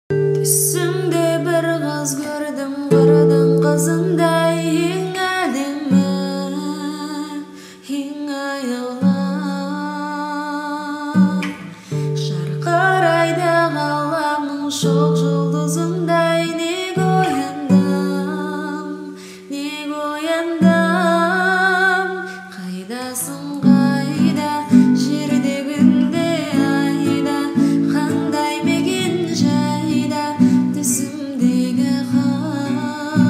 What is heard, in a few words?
gitara